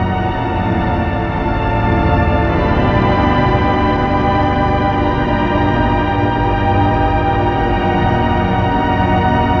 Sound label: Synth Pattern; Electrocution